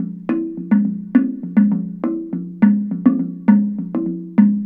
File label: Slit Drum; Ambient; Sonic Foundry (Sony Creative Software); Ambient Atmospheres & Rhythms